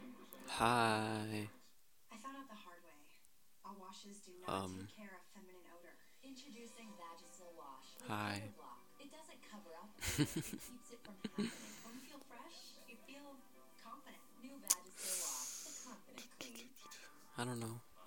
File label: hehehehe giggle